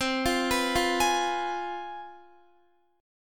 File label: C Major 7th Suspended 4th Sharp 5th C down Guitar; CM7sus4#5; arpeggiated; 4E,4A,5D,5G,5C,6F